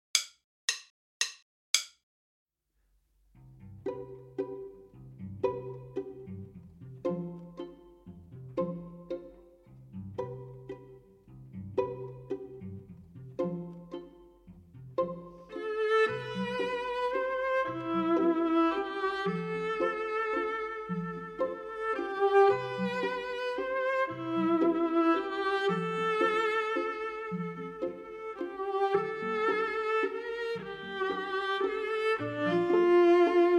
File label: minus Cello